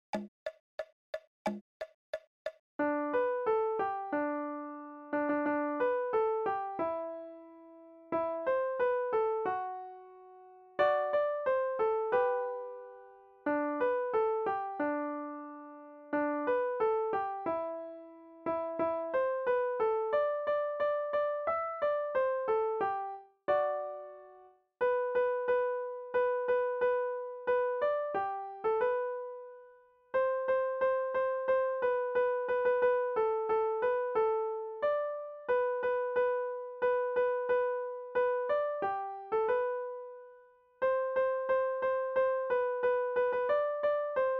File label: Jingle Bells RH